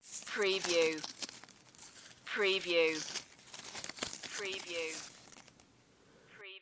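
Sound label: Leaves Crinkle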